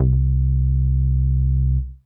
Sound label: Synth Prophet Bass C; Electronisounds